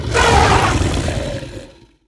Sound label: att, enemy, combat; baurg